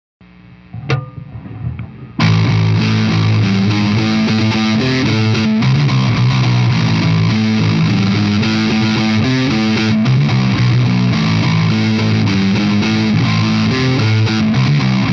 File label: Riffs